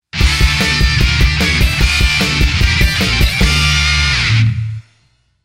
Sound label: 8bit Final Victory; Castlevania